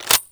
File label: pickup